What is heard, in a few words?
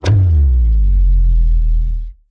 Hit Tonal Downward Spiral